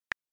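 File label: iphone keyboard sms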